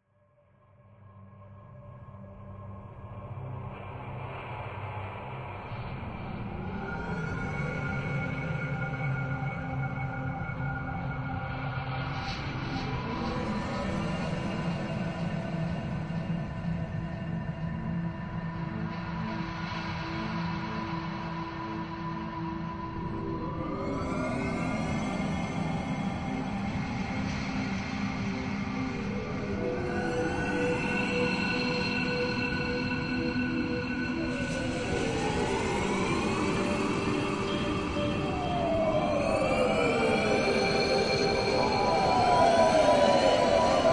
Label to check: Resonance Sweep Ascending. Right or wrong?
Right